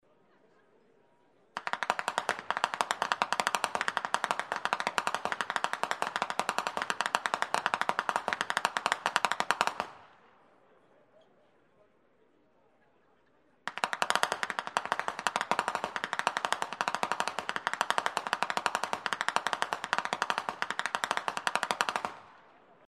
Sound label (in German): schnalzen; rupertigau